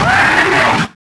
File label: Roar, Dino; Alberto